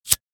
Bic lighter flicking attempting to light

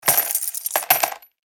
Coin tray cascade slot machine casino

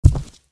Socks single step on wooden surface

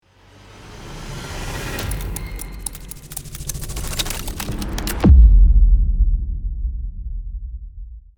Cinematic time freeze slow motion clock

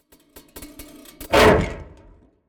Cinematic metal whoosh

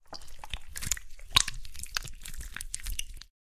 Slimy liquid movement squelches

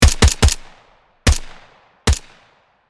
M4 assault rifle multiple shots

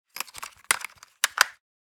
Headphone adapter handling and movement; headphones and speakers

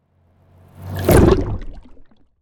Cinematic underwater whoosh

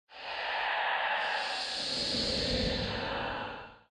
Slow ghost passing

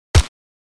FN SCAR assault rifle single shot

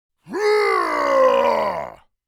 Orc creature battle cry

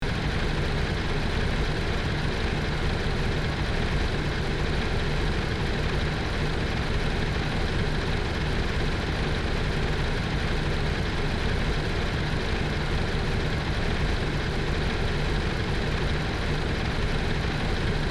SUV engine idling loopable

